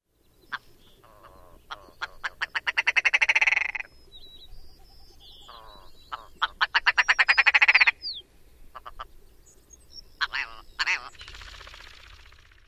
display sounds

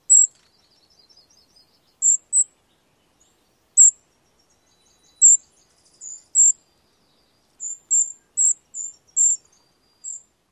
seee calls

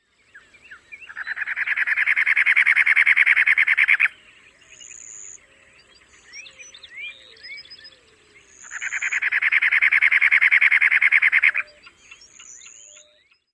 song; TX

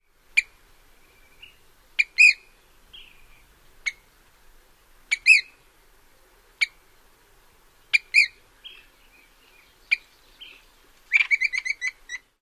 calls

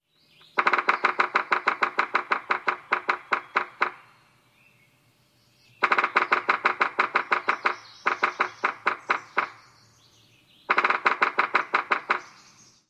drums

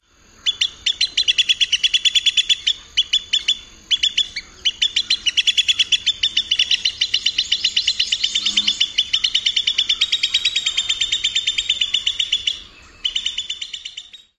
rapid peeping alarm

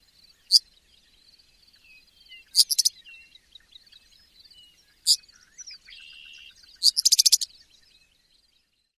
zeet amp chatter

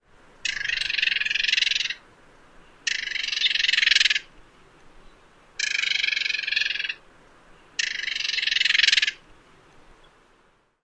scold call

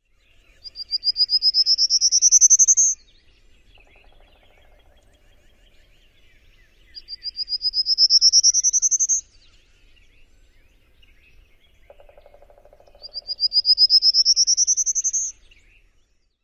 songs; KY

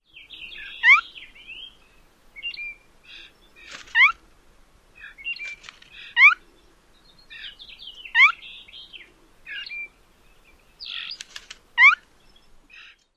calls

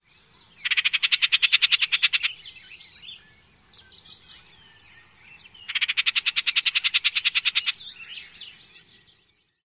chatter call

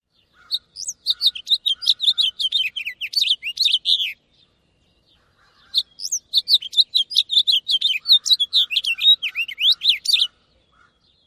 song; NY

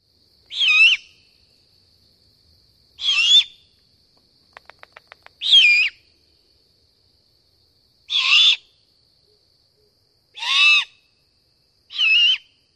immature calls with bill snapping

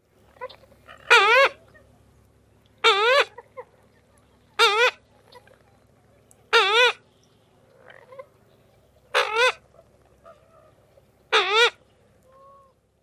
call variant

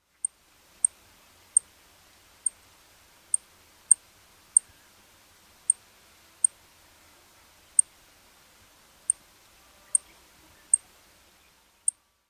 calls

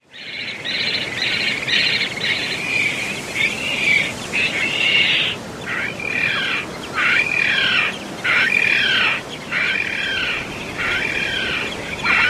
calls from sea

